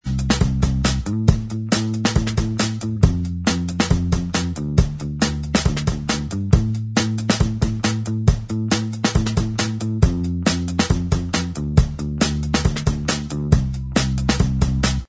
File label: Inst Track